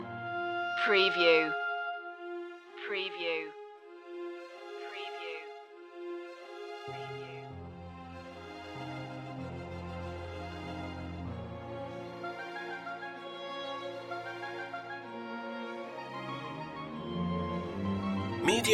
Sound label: falling angels loop